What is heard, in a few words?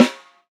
Snare Drum; drumthumper; oboe